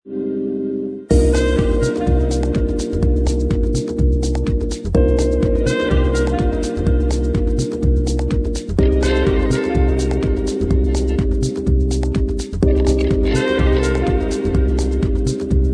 curious groove

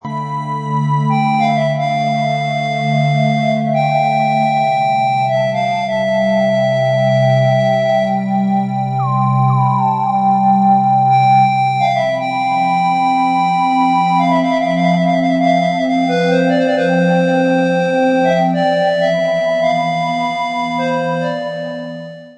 flute

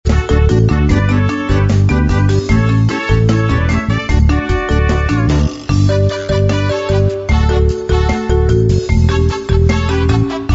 Island People Dance

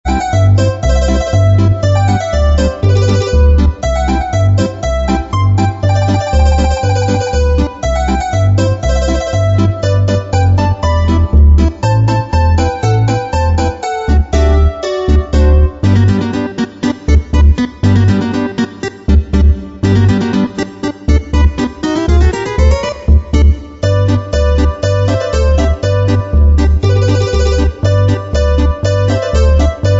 Greek Folk

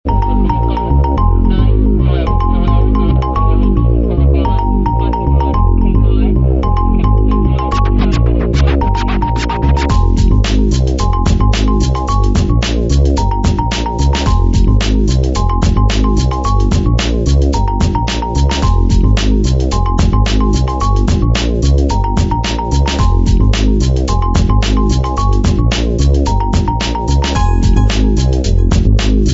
Hip Hop Jazzer

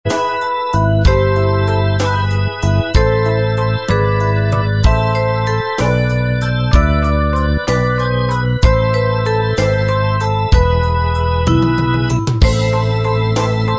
Smooth and Slow

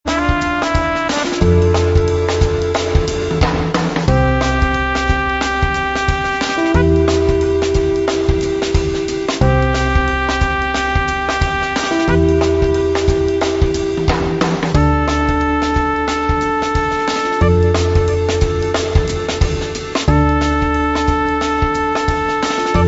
Swinging Late Night

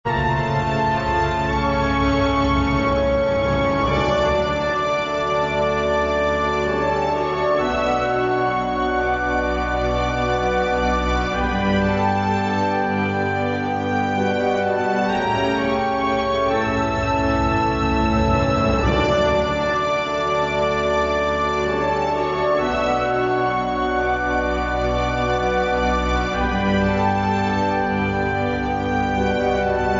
Love Song; bg-music

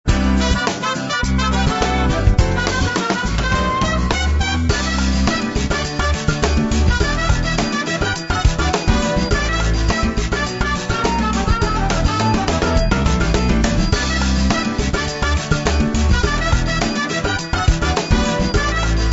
Hot Brazilian Nights

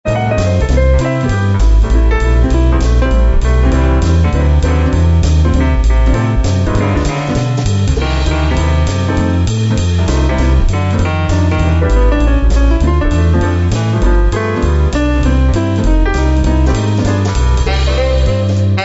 Swing; bg-music

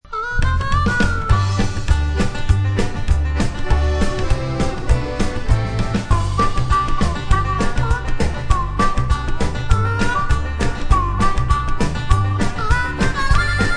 country

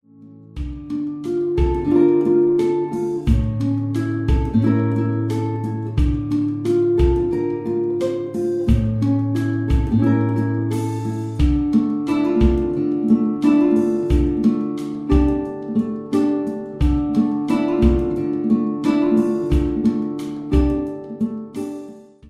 laid back guitars

